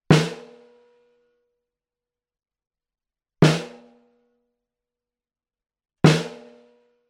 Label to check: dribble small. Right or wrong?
Wrong